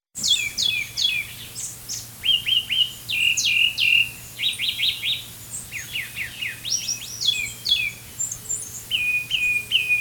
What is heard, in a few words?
White eyed Thrush song; Robert L. Sutton